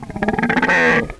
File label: voltigore alert; svencoop